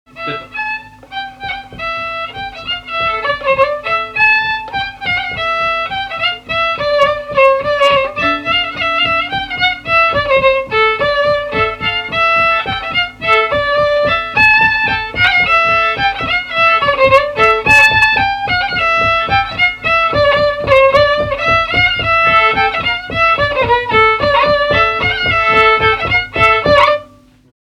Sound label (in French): Bourrée; Bourrée La Chabra bruna